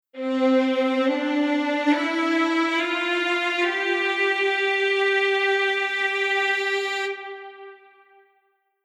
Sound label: Strings Exp Exp Off